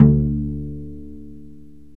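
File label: strings violoncello pizzicato, Chamber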